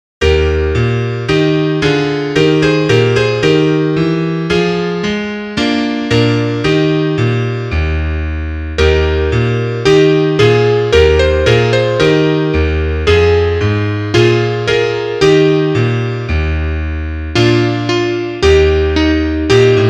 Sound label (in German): Klavier; kling gloeckchen kling